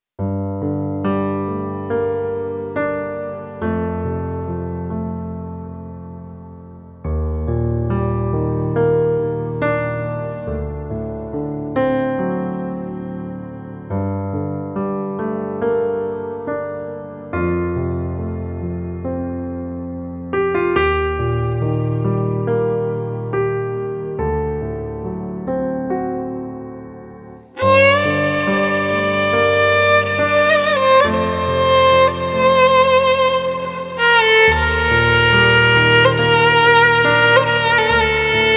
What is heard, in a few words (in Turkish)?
dram keman solo; by burak sound bank; dramatic dram vol 1